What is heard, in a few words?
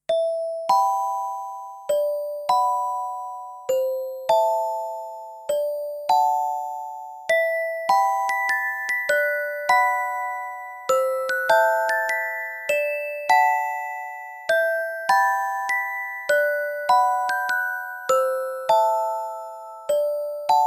experimental rainbow Bell